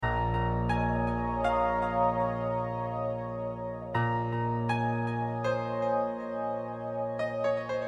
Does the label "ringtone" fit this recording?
Yes